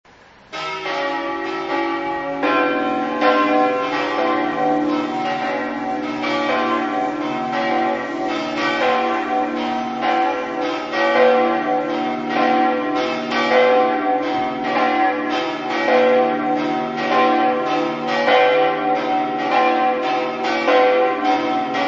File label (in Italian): campane; ruda